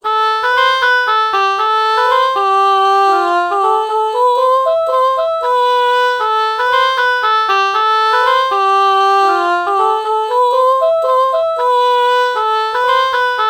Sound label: ah oboe melody morph